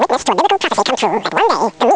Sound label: desert voice; Amiga Soundtracker Sample Packs